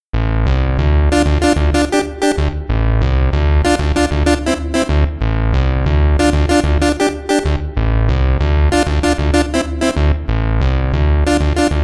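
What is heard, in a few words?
Clavi, Korg Poly-61 synthesizer; SoundDiviner Project; Korg Poly-61 Pack22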